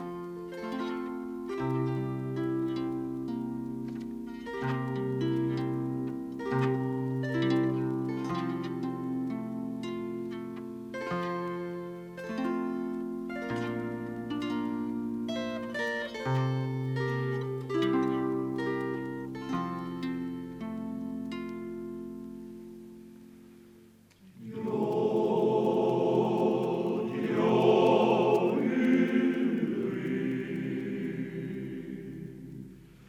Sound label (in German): Jodler